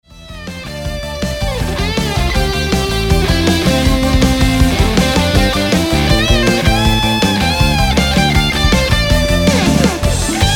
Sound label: Instrumental; The Seven Stages of Man